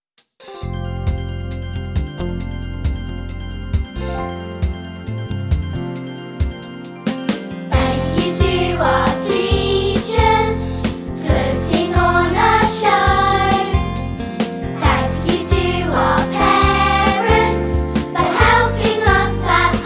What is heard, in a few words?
Vocal